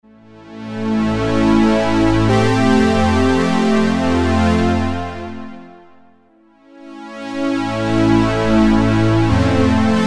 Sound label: Strings; Cheetah MS6